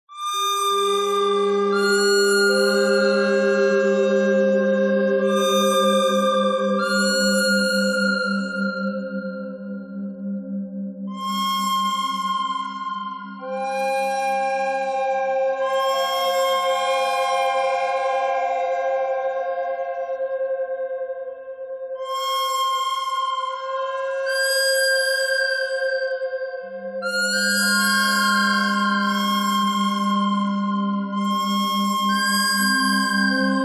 bird thread simple; noreverb

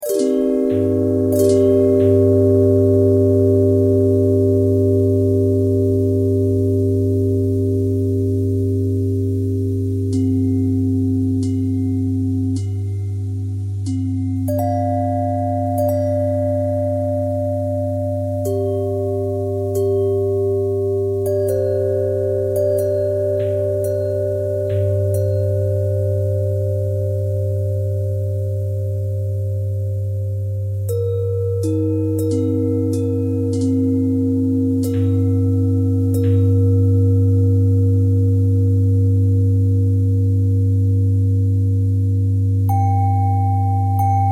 bell bell thread pentatonic, bells

